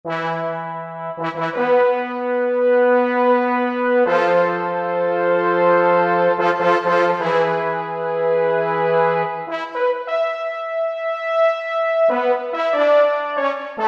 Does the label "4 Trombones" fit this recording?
Yes